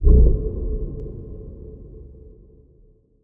moan, ghast; Minecraft; Votive World

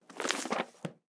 close put, book; item; Minecraft; Votive World

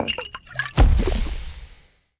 brewing stand brew; Minecraft; Votive World

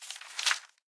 open flip, book; item; Minecraft; Votive World

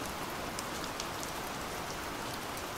rain, ambient weather; Minecraft; Votive World

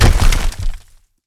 land, pointed dripstone; Minecraft; Conquest Default

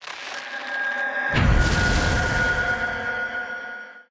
activate, beacon; Minecraft; Votive World

